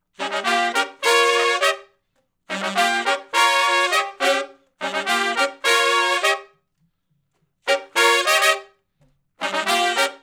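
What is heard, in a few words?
R&B Riff (Eb) harmony, Horncraft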